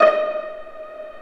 Vla Piz D#4; Digital Sound Factory Virtuoso

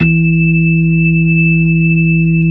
B-3, Hollow

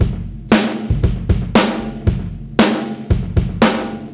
116 no hat, Beat Loops; Zero-G; Monster Beats

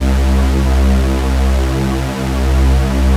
OB BIG P; Synclavier Sampler Library

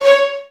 VN FX, Virtuoso Strings

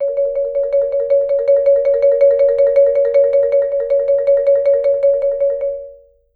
C#4, Marimba; roll; Sampleheads - Dave Samuels Marimba & Vibes

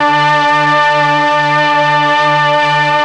STR, M400; ILIO; Propeller Island Legendary

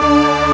ORGAN; Techno Trance

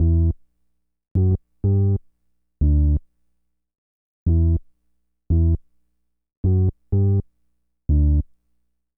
bas E min, Hip Hop & RnB; Best Service ProSamples vol.34; Just Butta

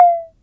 SYNCUSSION

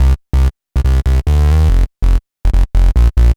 Bass, uk garage, 142bpm; Szeet

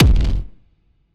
Hit, fx

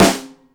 SNAP FLAM; InVision Interactive; Lightware VOL-1; Instruments & Percussions

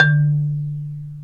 Bass Marimba

